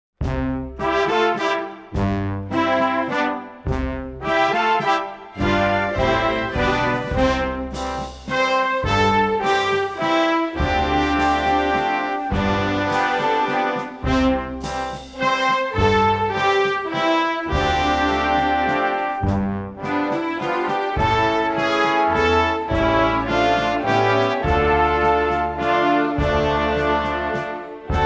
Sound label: Take Me Out To The Ball Game,recorder; recorder karate